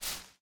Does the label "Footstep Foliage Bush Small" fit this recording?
Yes